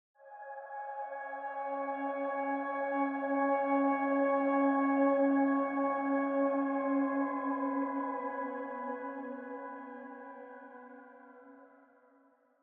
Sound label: Warning Sting